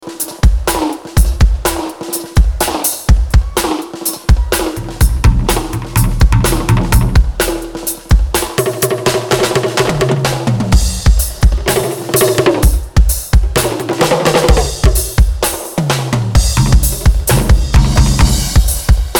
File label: Drum Dub